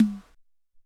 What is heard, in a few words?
Shhhh